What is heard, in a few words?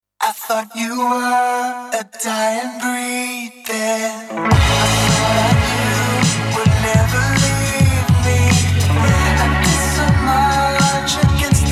Indie Ocean; San Fierrro